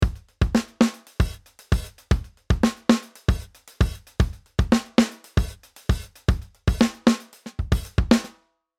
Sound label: Addictive Drums 2 Funk Kit